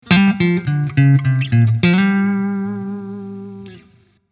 Blues Licks

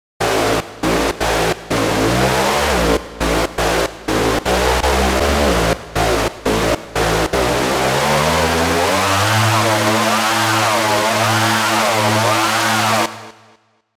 Rauschen ohne Rauschgenerator; bx oberhausen

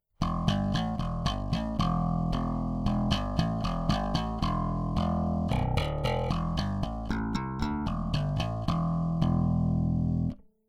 Bass Scheppergeraeusch dry; Drawmer 1972 Bogart

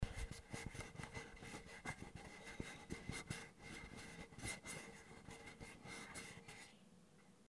Zoom H4n Handgeraeusche

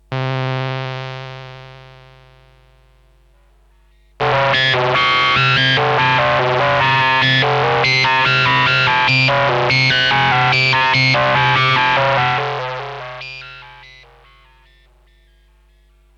Radius Synth Ring Mod mit Step Sequencer; Red Panda

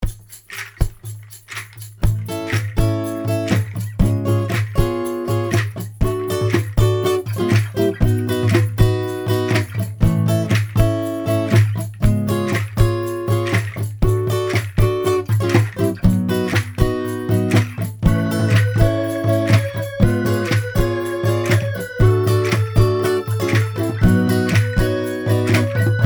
AEA R88 II Akustiksession

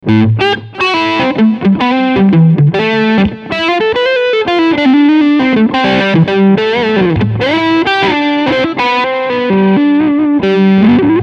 Lick beide PUs Crunch

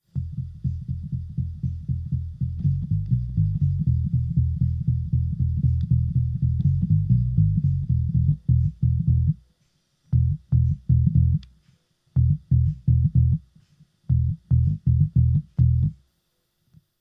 Song Ukulele Bass FET Niere Fender Bassman Dry; Lewitt LCT 1040

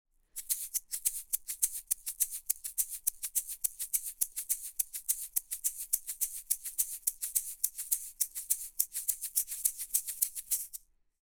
Shaker einzel nah

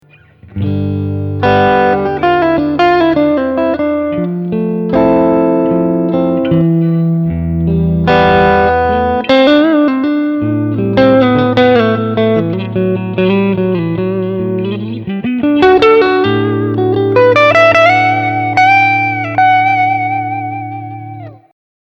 Narrowfield Hals und Middle

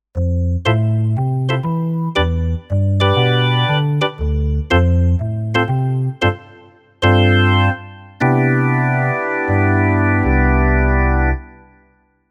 Kurzweil KaE1 Orgel

